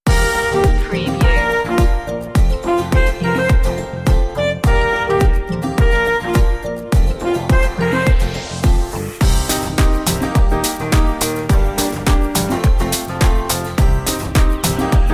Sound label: island beats